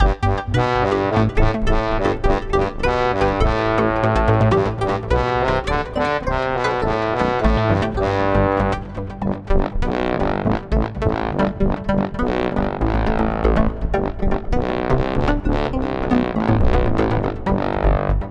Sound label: Loop